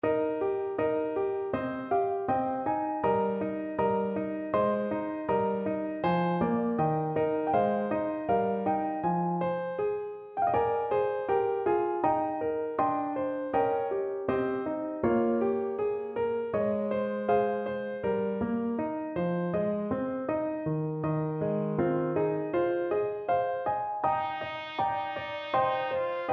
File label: trumpet